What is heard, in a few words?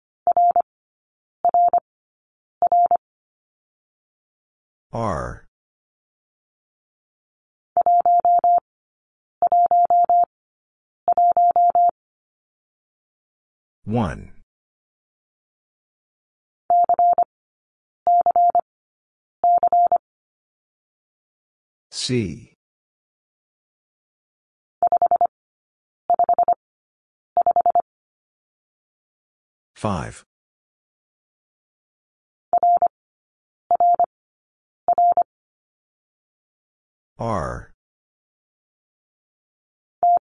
Letter c - Single Character Repeated 3x 25wpm; Lesson 16b